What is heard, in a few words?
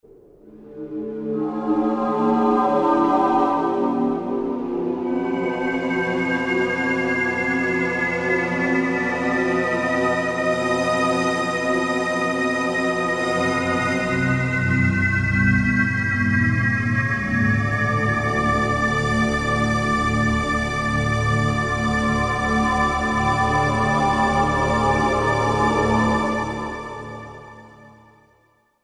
Chronos, Roland SR-JV80-04 Vintage Synth